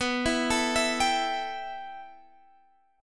B 7th Suspended 4th Sharp 5th, Guitar, Half Step Down